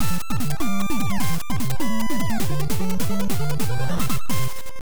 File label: Stage Clear; Kira Kira Star Night DX; Family Computer